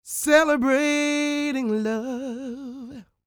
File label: CROON, Vocal Blues & Soul MELLOW